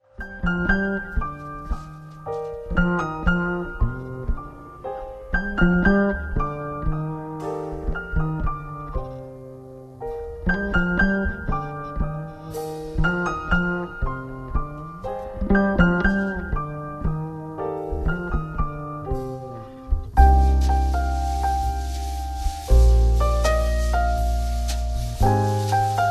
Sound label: jazz kolo